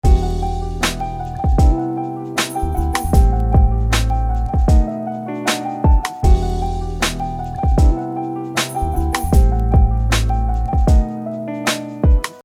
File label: TOTAL EQ